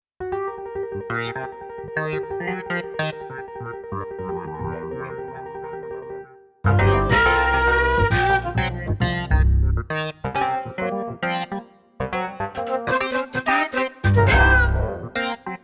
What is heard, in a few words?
pop demo; xp-80; roland; Multi-patch demo; roland demo cd-3